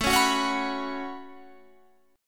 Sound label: B 7th Suspended 2nd, chord